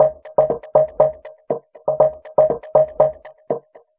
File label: Condensation Beat; Electronica; Solo Beats